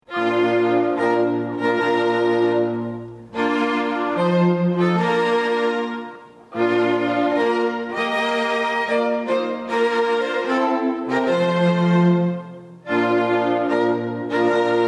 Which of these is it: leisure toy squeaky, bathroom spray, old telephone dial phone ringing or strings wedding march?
strings wedding march